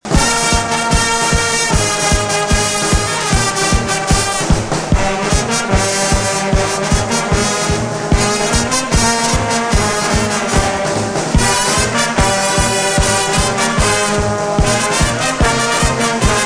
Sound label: flamenco trumpets